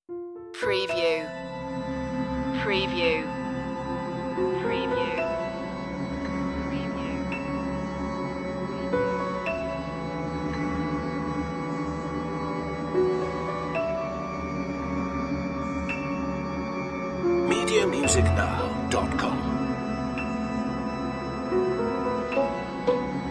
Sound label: tension